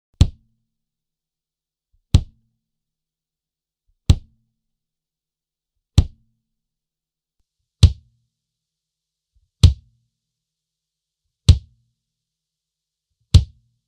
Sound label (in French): kick, son enregistré sec (D112 sans eq ni compression); batterie; pendant 4 coup